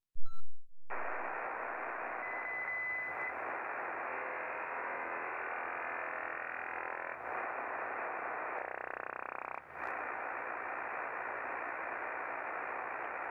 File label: mystery pulses; Haarp